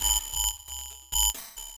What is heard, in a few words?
beat band h; DirectX SDK; DMusProd